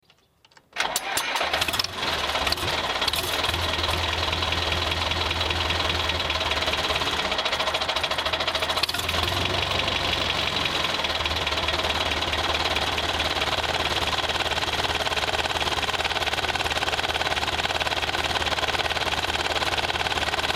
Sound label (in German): traktor, verkehrsgerausche